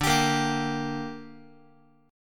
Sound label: D Suspended 4th Sharp 5th down Guitar 4A,4E,5A,5D,5G sharp,6C sharp; strummed